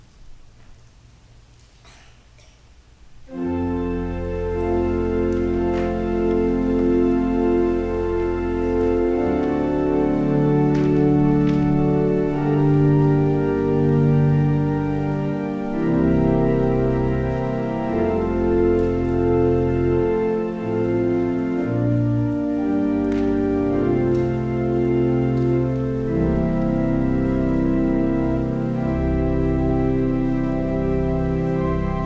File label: Organ Music